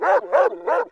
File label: Dog Bark